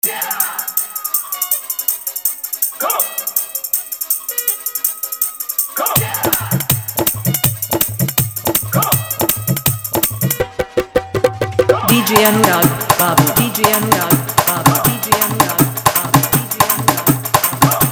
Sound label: Full Dhollki Bass Dance Mix